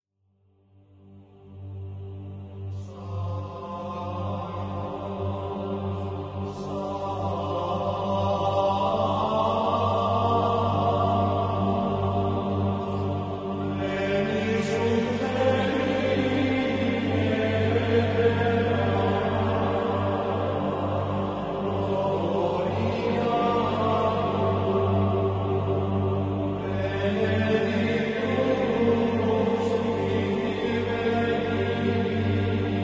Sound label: music